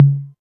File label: tom mid, Novation Drumstation